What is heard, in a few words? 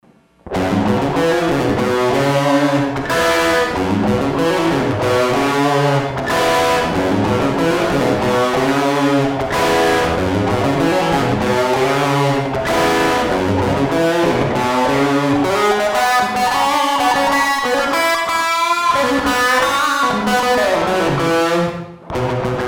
HD DSP with Dist 2